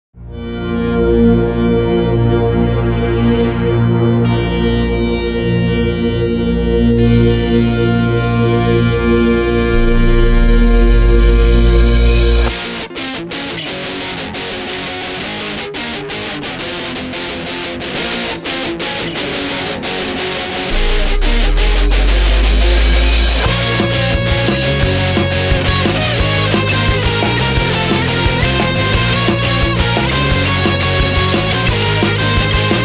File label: Instrumental, Pop-Punk